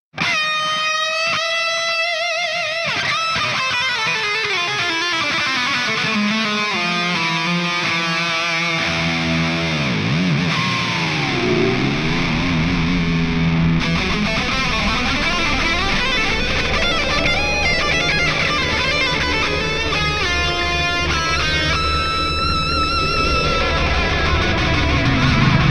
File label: Battle Theme